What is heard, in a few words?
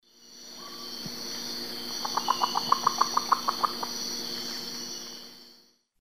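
Nyctibius aethereus, flight calls playback; S. America; Eastern Lowlands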